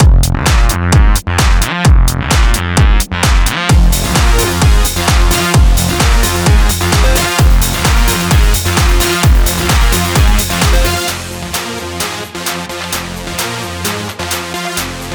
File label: Party Pop 130B